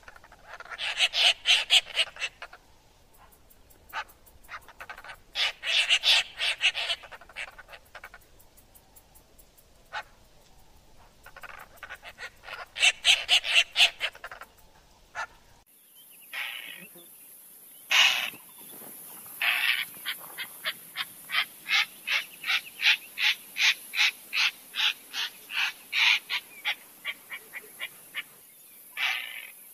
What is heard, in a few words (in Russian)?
roller, zvuk sizovoronki